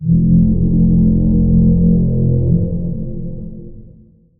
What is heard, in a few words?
battleship horn